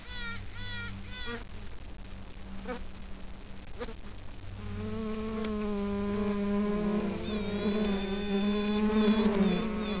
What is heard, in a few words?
piping, beekeeping